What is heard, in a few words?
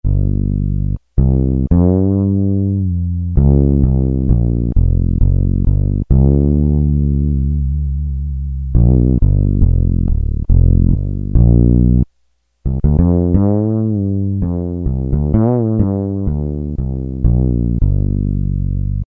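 Fretless Bass, Roland W-30; Factory Performance Patches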